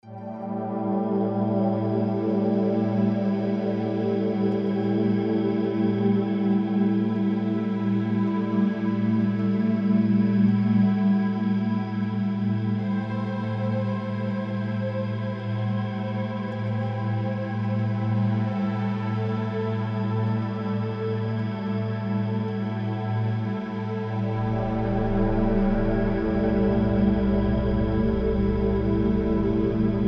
Amb Sound Scape Airy ambience, Echoing harp sounds; Outer Space - Floating; Ambient SoundScapes